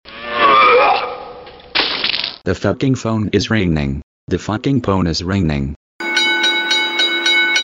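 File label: Its Ringing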